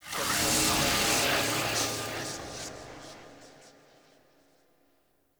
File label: Puzzle Mural Hint; Puzzles; Circade